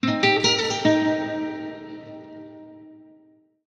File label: Stinger Guitar; World of Warcraft 9.1 Chains of Domination; Tazavesh Stinger Guitar 4